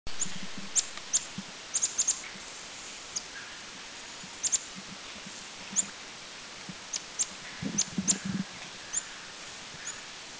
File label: Chestnut-vented Nuthatch; Doi Ang Khan